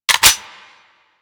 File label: Clip In; Warframe; Vipers